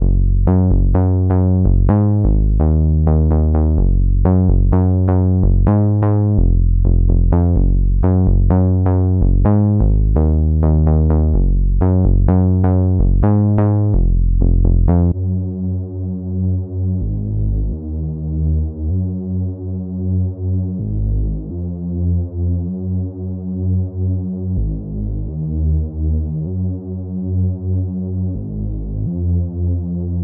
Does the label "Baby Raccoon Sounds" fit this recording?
No